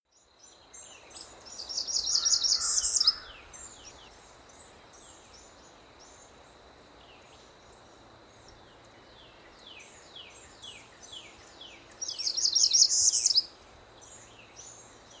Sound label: Northern Parula Setophaga americana